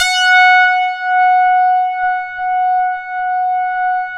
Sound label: MATRIX 12, OB Synths, Analog